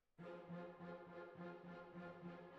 Cbasses

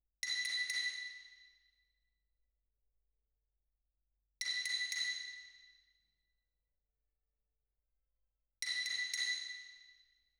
Xylo

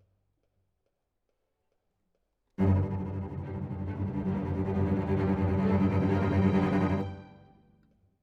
Cello Solo

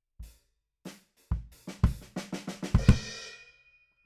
BD Out

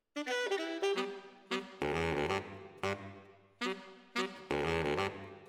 ORTF SCHOEPS, piano

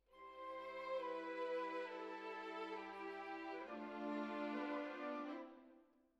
Violins Over

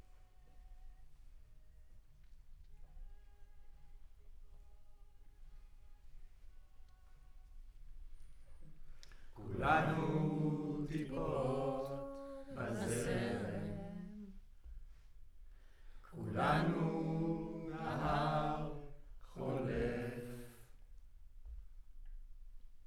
Center u47, Choir

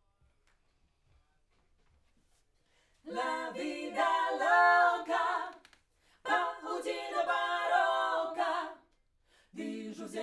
Backs SCHOEPS

